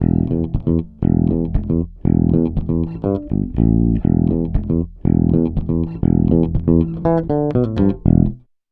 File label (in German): E-Bass; Tegeler Audio Vocal Leveler; ohne VL 500